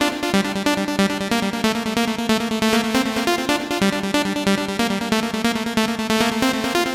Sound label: Tri Lead